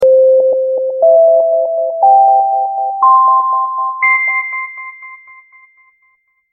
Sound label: Futuristic Ringtone